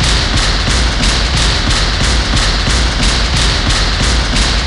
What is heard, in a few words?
Teeth Hits Loop